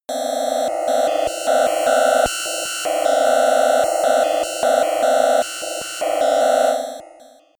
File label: atmosphere on off